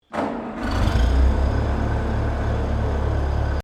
Tractor Furguson 1956 startup, Old Farm Tractor; Tractors; Vehicles

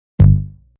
Bass Doing

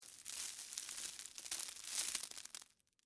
Debris Ice Cream Cone, Soft; Texture; Materials; BlastWave

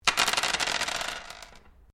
Dice Mixed Glass Table Throw; Variety of dice sounds; Casinos

